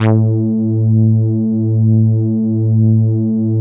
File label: MOOG TAURUS PHAT A2; Big Fish Audio - First Call Vintage Keyboards